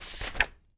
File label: turn page, reading book; 1000fx